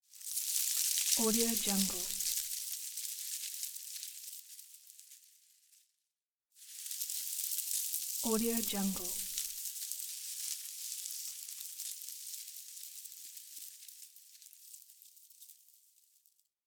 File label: confetti